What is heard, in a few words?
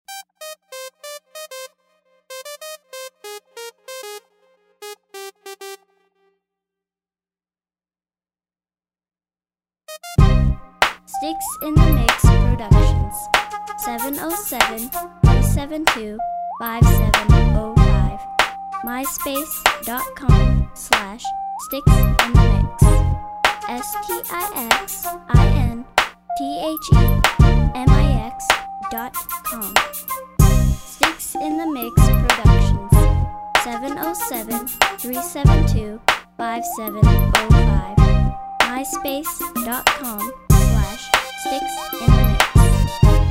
jazzy